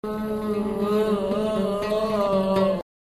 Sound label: loop